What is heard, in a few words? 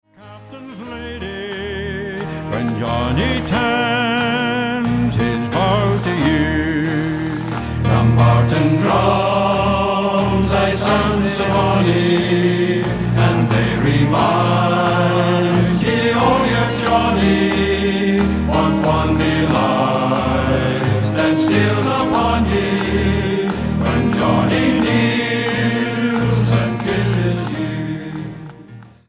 drums; dumbartons drums